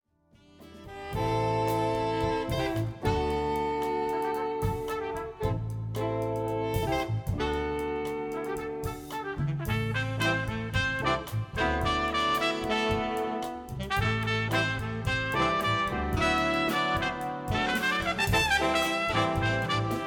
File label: big band